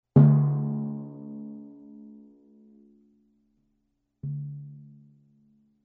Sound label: Piano Forte